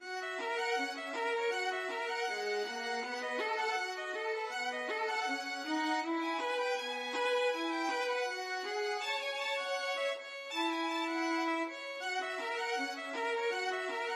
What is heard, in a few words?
violin duet